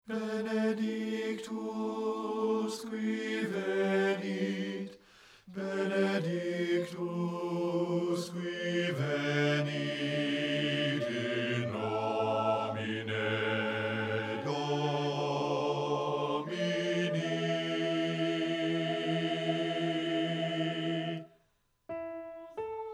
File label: BASS; satb